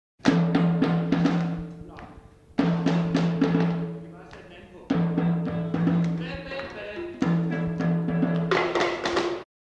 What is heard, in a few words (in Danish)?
Sang; Samba